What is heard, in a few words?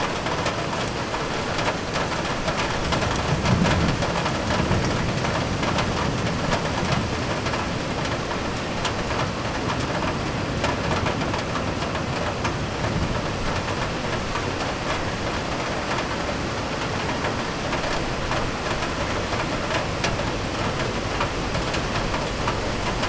heavy rain